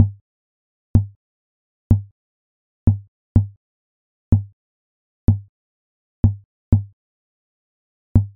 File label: morse; theoretical telegraphy